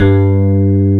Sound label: G1, Piano, ELECTRIC